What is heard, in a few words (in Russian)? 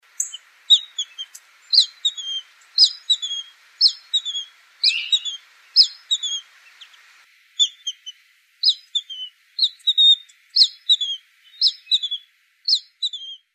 korshun missisipskij